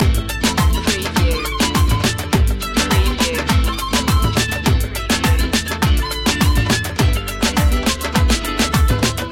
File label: global flamenco loop